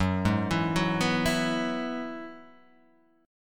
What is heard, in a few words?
F sharp 7th Suspended 2nd Suspended 4th F sharp down Guitar Half Step Down